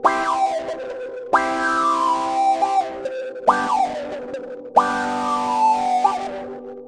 wah guitar; guitar loops; fukdup

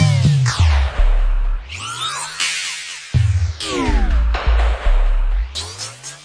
153 bpm sea sick drop subs; 141 to 160 bpm

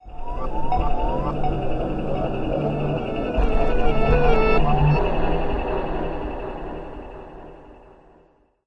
ambient back pad, synth

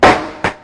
SN, Dirty Hip Hop Kit; style kits

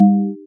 Syn Dr, Overloaded Synthetic Drums; style kits

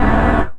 thick short ambience, pads

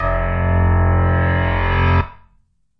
Moo Piano F1, backwards piano

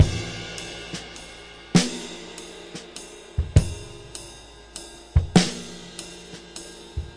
six eight Loop, 100 to 105 bpm; Six-Eight Loops

